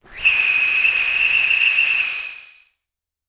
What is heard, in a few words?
energetic high pitched; baking